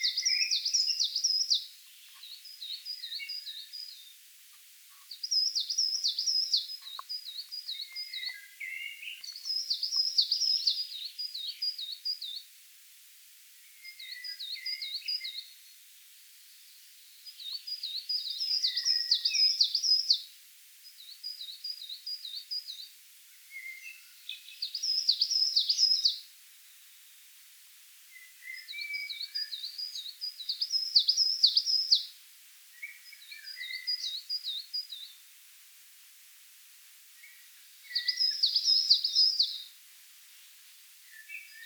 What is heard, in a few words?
Periparus ater